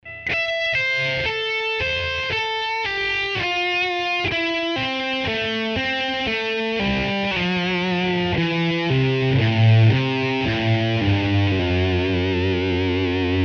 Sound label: scale patterns slow, guitar